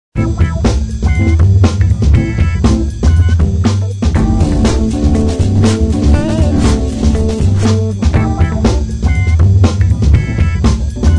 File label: Groove